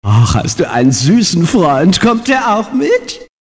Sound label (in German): Jingels